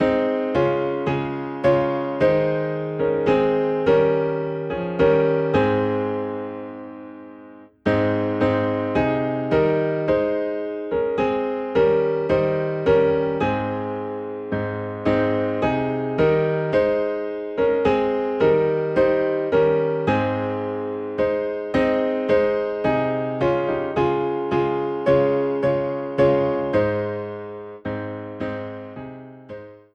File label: Piano in A Flat; Whiter Than Snow